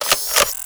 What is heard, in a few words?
sniper reload; combine sniper; hl2 weapon